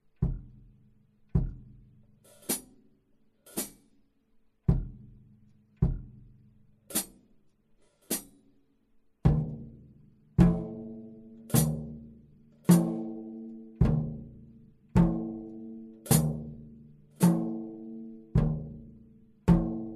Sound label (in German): doppelschlaege, schlagzeug; Eine Sambafigur, nach und nach erweitert.